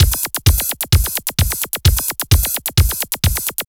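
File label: drum loops, right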